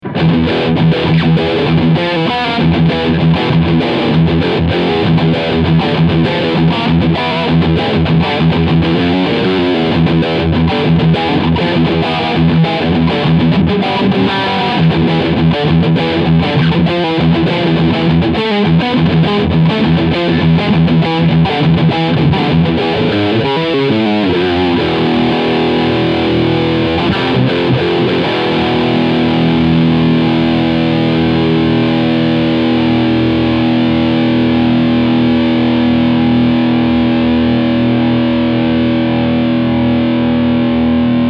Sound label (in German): Riff Steg Humbucker